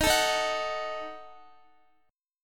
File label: Eb Diminished, Guitar; Ebdim strummed